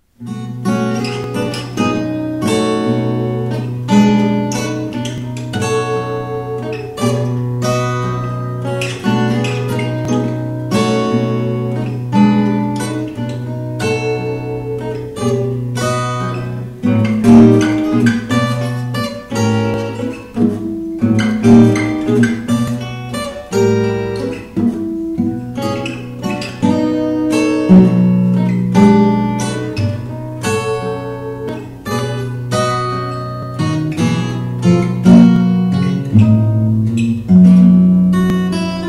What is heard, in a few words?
mississippi blues